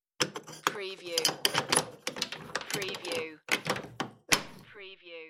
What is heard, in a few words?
Door Insert Key, Turn Key and Lock